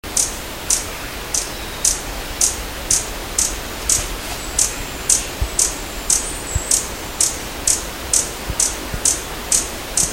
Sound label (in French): ariane de linne, chant